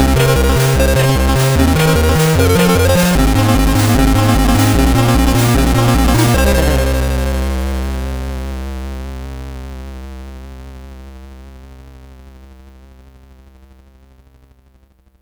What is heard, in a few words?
sid, CSG8580R5; Cloud Kingdoms